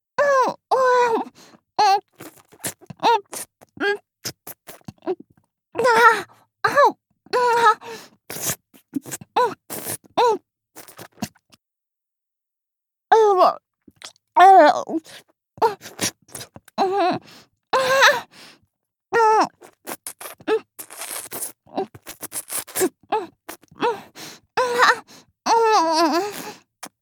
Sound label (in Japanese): フェラボイス; 長女